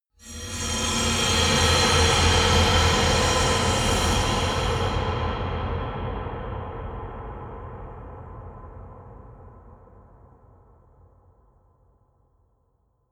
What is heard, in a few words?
metal bright reverb; maschinen; soundpool